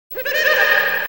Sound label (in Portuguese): risada